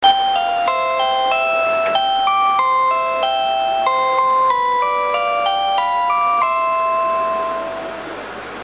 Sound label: train chime; スーパー白鳥; ７８９系のチャイムです。; チャイム１〜４は車掌放送用です。